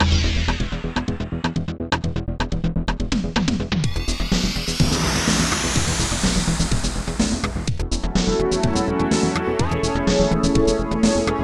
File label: metaclock; MED/OctaMED (4ch); OctaMED v5 MMD2